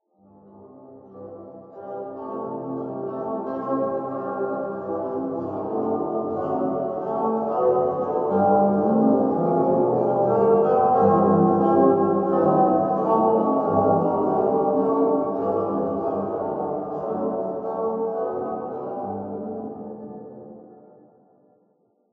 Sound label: Jazz